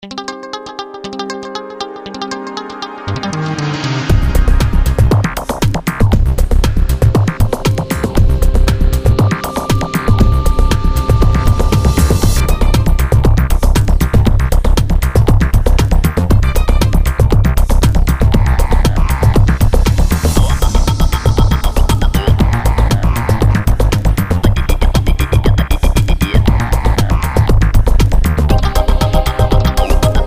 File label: Air Elemental, Music background pads